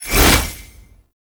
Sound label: gunbrella aim transition in; Weapons; Warframe; Tenno; Koumei